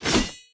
Attack Light; bloom minigame

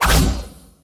Attack Jump; bloom minigame

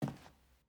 Footstep Wood

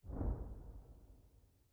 Map Hover Generic; Sevika

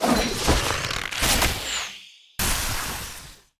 enemy attack puddle cast; bloom minigame

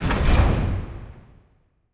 smelter furniture grate

